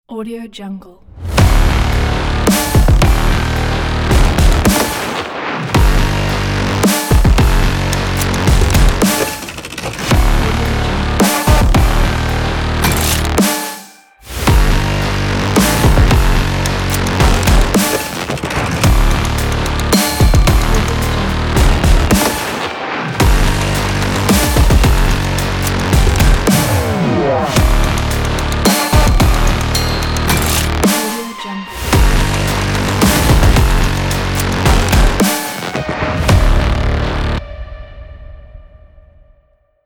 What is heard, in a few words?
hollywood cyberpunk epic cinematic trailer